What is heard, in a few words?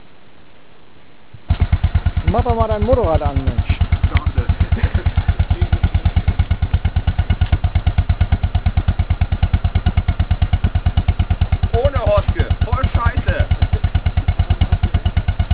R26, BMW Einzylinder